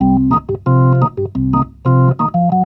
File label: B3 LOOP C; B3 PLAYED